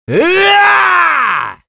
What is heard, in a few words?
Casting, Vox; Mitra; kings raid; en